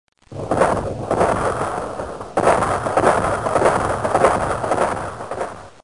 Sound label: antiair